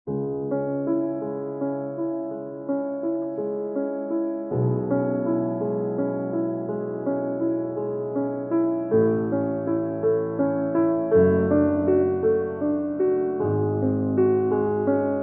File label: Piano Sonata No. 14 in C-Sharp Minor Adagio Sostenuto; Op. 27 No. 2 Moonlight Sonata